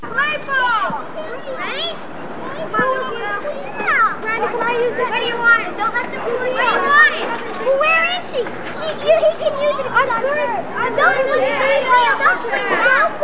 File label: kids; Know Your Baseball - Greatest Player